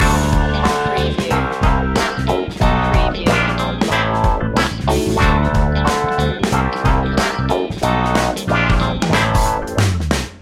funky punky loop

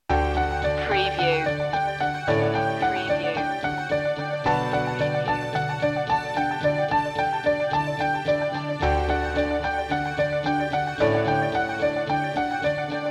pop celebration

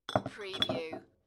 Beer Bottle Clink; beanstalk audio

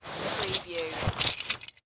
Handcuffs Remove

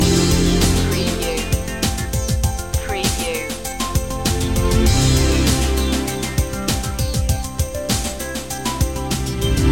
impulse loop

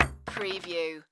Bullet Shell Drop Machine Gun Hollow Wood

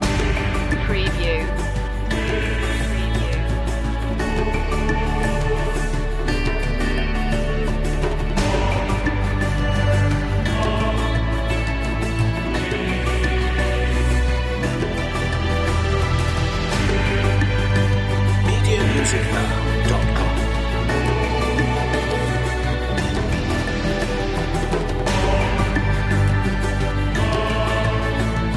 with choir

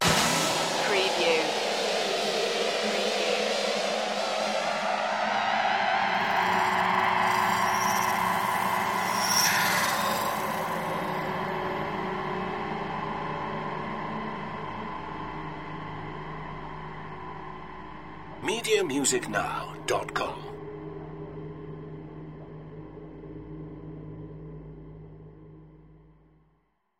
horror steps out

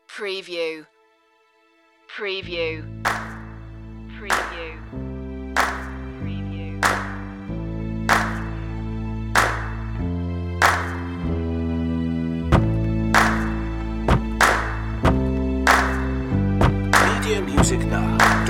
community underscore

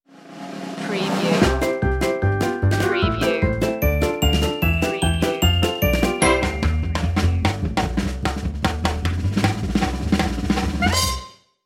classic cartoon wacky theme

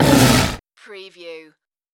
creature vocal

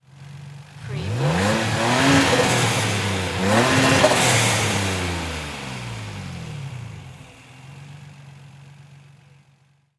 NISSAN 350Z ENGINE TRIPLE REV

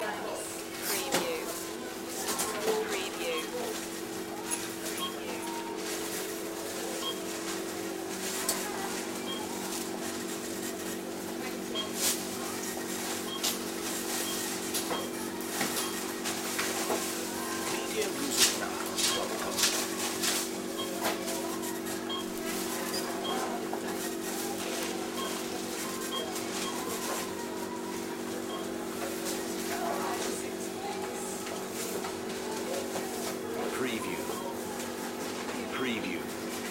store crowd

